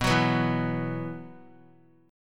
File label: B Suspended 4th; Bsus4 strummed; JGuitar